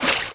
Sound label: h2o hit; Digital Paintball 2